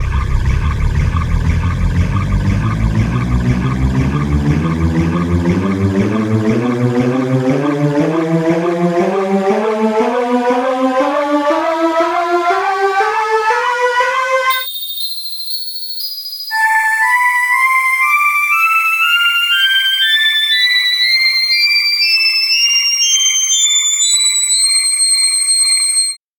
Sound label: goblin; tonleiter